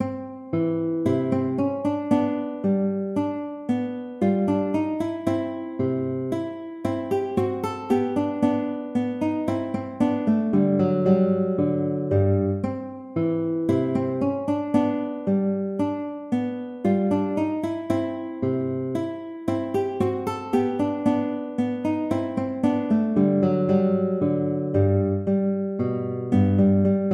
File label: minuet; mozart wolfgang amadeus